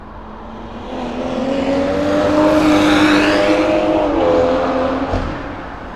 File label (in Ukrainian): Спорт тачка